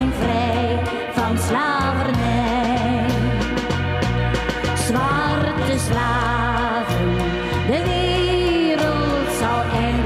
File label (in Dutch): Slavenkoor